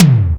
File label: DX Low Tom